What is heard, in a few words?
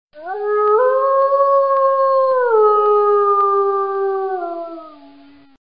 wolf sound notification